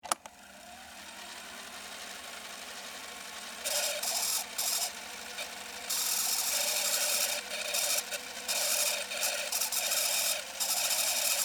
Technology, Tape, Cassette, Player, Rewind, Tape, Panasonic, Handheld, Recorder; Blast Wave